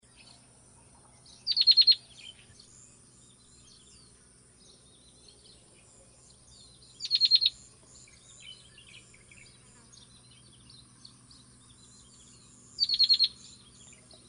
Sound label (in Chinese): 灰頭鷦鶯; 扇尾鶯科/留鳥